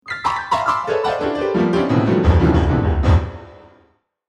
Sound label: Plinky Plonky Piano, Random jumbled notes on piano.; Pianos; Musical